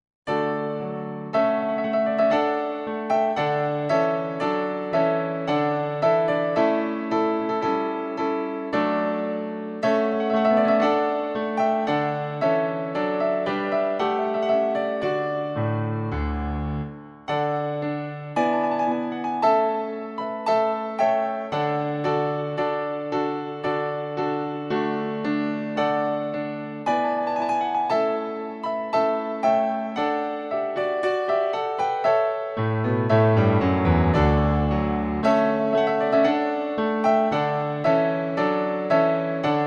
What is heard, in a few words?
Trumpet Voluntary